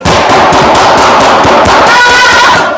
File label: gun shooting